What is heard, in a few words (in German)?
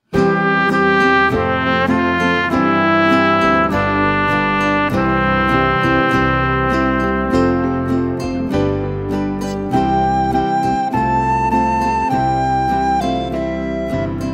blockflötentaxi